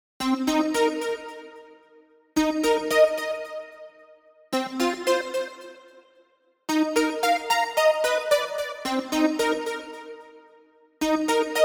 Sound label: Spaced Melody, retro synth wave; retro 80s synth wave; retro 80s synth wave presets abx3 vol1